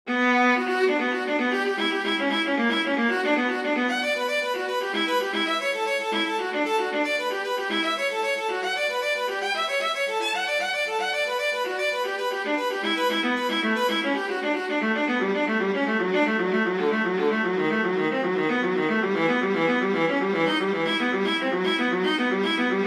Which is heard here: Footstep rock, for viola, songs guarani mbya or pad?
for viola